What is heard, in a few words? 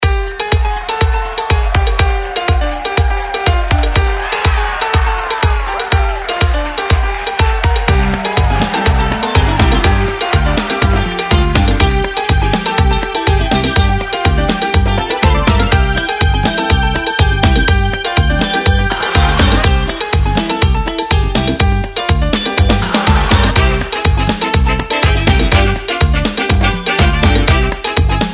JINGLE INTRO